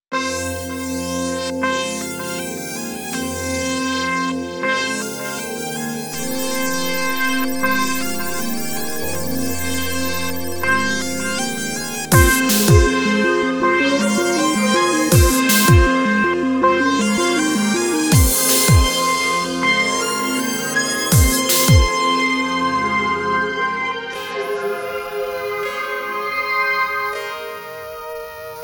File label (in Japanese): トランス Off Vocal